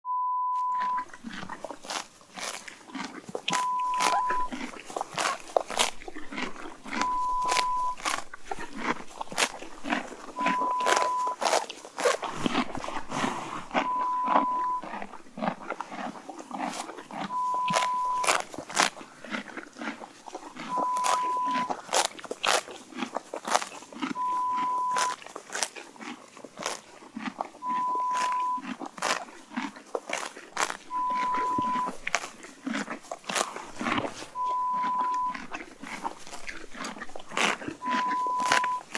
Horse eating grass

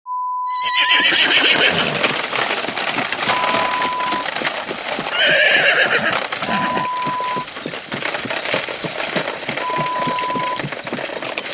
Stage Coach, Horror Sound Effect; Lo-Fi; Draculas Stage Coach

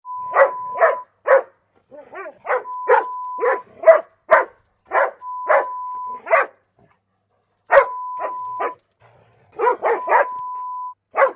Dog Barking sound

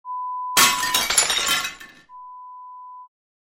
Window Smash

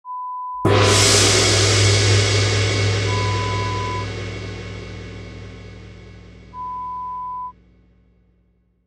Gong sound

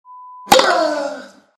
Tennis serve

